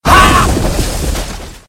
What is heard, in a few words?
power